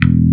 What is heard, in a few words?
ROCK BASS E1; E-MU Formula 4000 Series Vol. 5; Protozoa; Proteus1 Instrmt